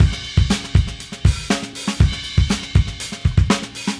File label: Funky Drums Crash; Sonic Foundry (Sony Creative Software); What it Is! 70s Analog Funk; The Funky Mama